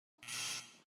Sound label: incorrect answer; family feud ph